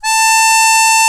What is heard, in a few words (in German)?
MUNDHARMO; Alpine Volksmusik